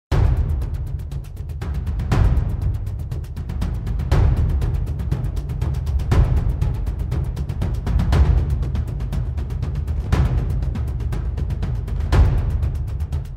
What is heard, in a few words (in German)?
Dark City Basic Accents A 16th feel Variationen über C1